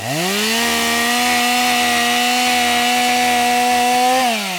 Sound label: chainsaw on